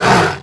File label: gloves attack; weapons; breadmonster